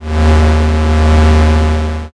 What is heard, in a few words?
space engine